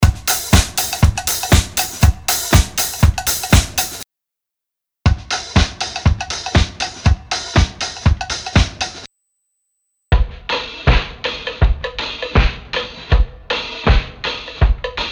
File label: Original dann TAL 16 Khz C3 F2 C2